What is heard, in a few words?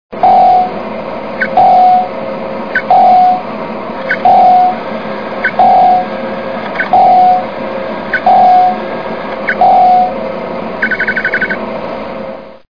radar; 2001 and 2010